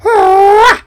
Attack Long; Sly

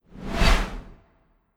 Skel Drake Spectral

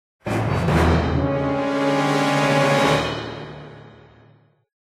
Level Fail; Buildalot

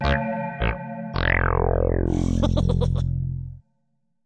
Gameover

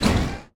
swordAttack; enemies

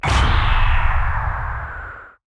Large Sustained Beam

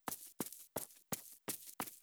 Hero Run Footsteps Grass

